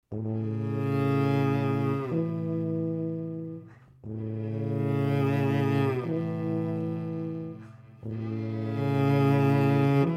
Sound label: Dreamy waltz cello tuba